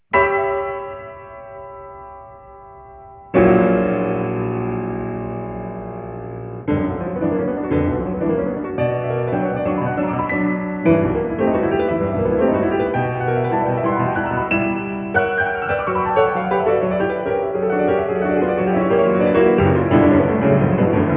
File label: Chopin, amplified mono; 8000 Hz; 16b Win-PCM